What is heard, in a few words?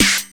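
snare drum, 170 BPM, drum & bass; Ueberschall; Drum & Bass Flash Trackz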